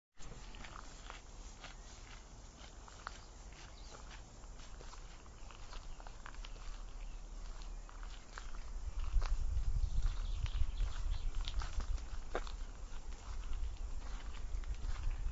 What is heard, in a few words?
cullen point lookout